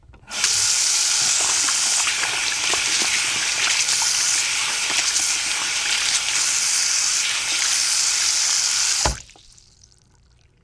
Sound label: hand wash